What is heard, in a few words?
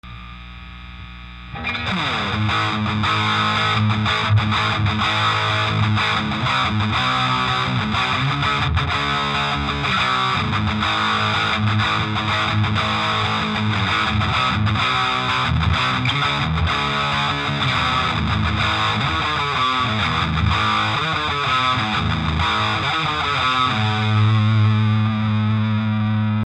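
firebird rear, Electric Guitars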